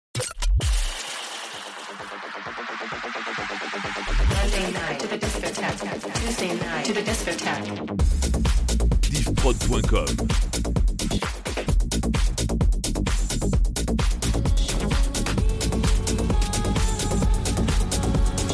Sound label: Medley Techno